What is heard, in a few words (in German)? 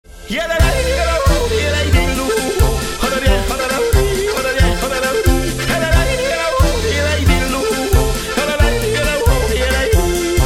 Jodler